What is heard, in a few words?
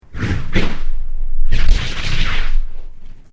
Created Creature Medium Vocalise, Alien Sound Effects; Created creatures, vocalisations and pretend speech